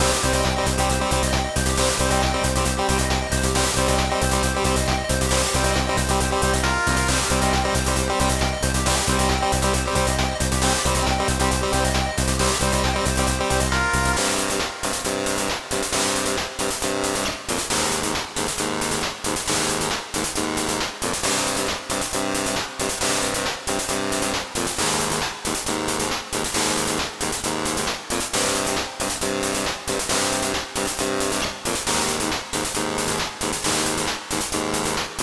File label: bonus bgm title